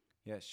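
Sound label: Vocal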